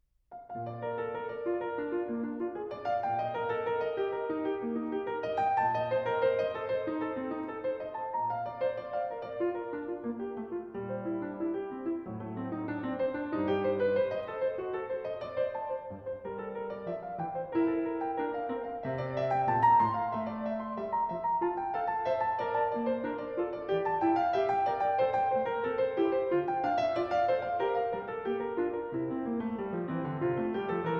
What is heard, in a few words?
Partita no. 1 in B flat major, BWV 825 - Allemande